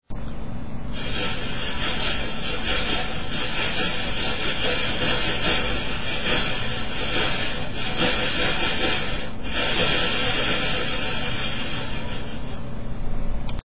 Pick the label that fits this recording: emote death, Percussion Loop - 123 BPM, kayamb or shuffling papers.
kayamb